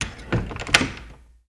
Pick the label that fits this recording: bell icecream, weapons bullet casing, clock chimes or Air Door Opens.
Air Door Opens